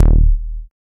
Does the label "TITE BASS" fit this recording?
Yes